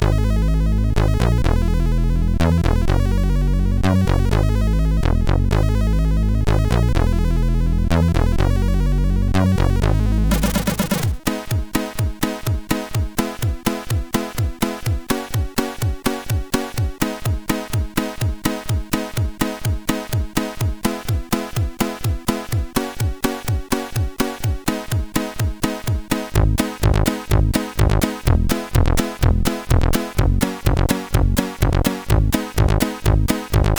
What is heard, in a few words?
Melody, CSG8580R5